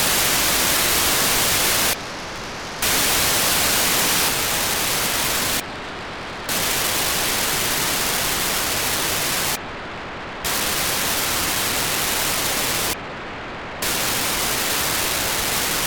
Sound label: 437MHz850 4k8 GMSK USP beacon, satellite; RS15S ORBICRAFT-ZORKIY; On September 17th 2022 at 09:59 UTC Daniel DL7NDR received on 437.850 MHz the 4800bd GMSK